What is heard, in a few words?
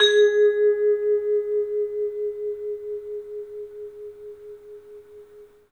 bell; 3D Sound; 3D Keys VOL-1